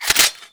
Gun Reload Weapon, Weapons Foley; SFX Kit

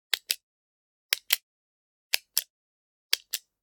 Generic Button Wood Tiny

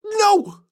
histeric short; donnie; Blind Drive

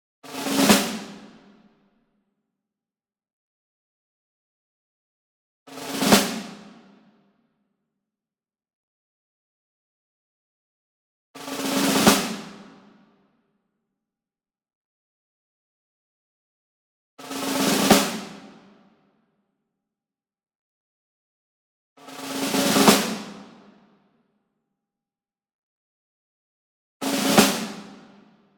SINGLE SNARE Pearl High Tension Roll Medium; boom library; Cinematic Strikes Construction Kit

